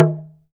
TALK DRM, Heart of Africa; Spectrasonics

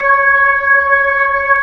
B-3, Jazz

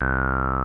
TB303; Zero G; Man Machine

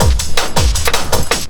Drum & Bass, 160 BPM

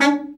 BRA Bari, SAX; Best Service Gigapack 1; BR SAX 2

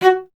STR VC F#2, Cello; Miroslav Vitous; Solo Instruments VOL-1

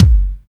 CLUB KICK, TECHNO; Drums In Your FACE!

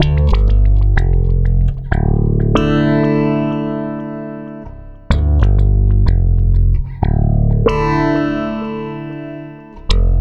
Stick Loop, Stick Bass

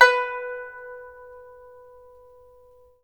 PIPA B5; Spectrasonics; Heart of Asia

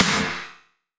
Snare, Gated Reverb; musicradar

